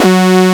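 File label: brass G3, SYNTHESIZER analog brass; AKAI S6000 CD-ROM - Volume 1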